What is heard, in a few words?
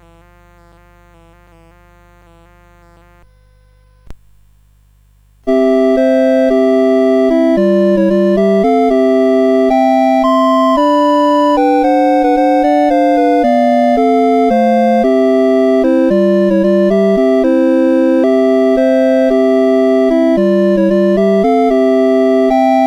CSG8580; Tree Song; CSG8580R5